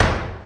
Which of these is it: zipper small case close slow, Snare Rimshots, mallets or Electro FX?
Electro FX